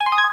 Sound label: click short confirm; Rin Skin 2.0